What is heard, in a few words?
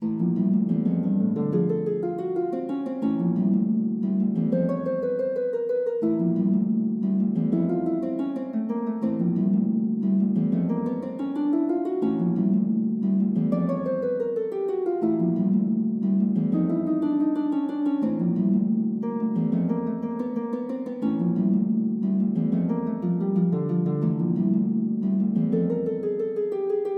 gigue, lute